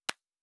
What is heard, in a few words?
Cards Place; Fantasy Interface; Fantasy Sounds Bundle